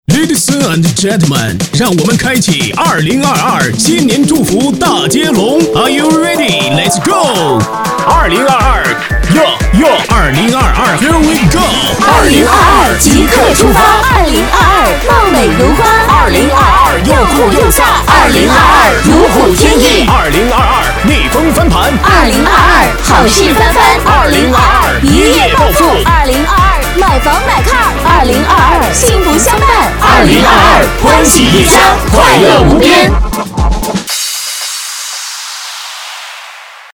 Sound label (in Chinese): 男 戏曲 男女说唱 节日祝福